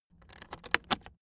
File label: aluminium case close buckle